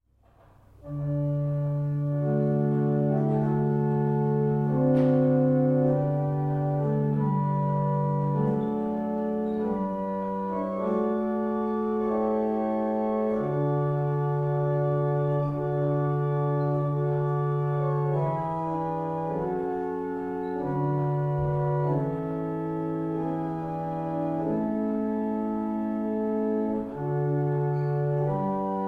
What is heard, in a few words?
barrel organs; Sutton Beds; New York